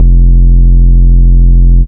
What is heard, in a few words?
Bass Shot F